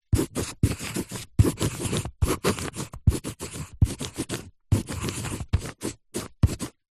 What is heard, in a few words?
office pencil artists thick writing on paper